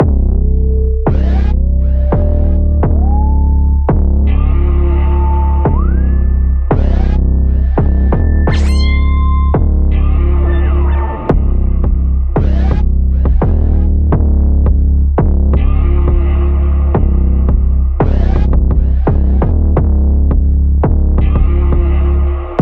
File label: Trap 808 Heavy Chill Trap Beat